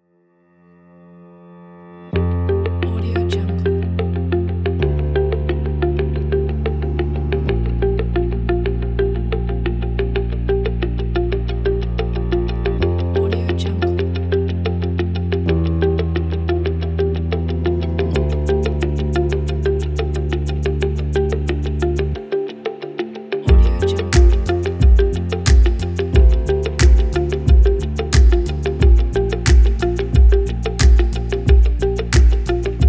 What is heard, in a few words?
ambient background music; reformesound